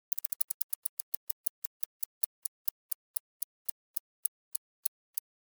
Clock Gear Mechanism Turns - Inside the Clock - Long Motion; Hollywood Edge; sound designer's toolkit v2